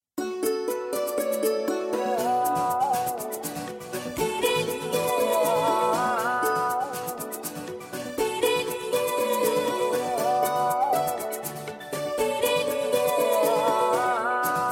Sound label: Hip Hop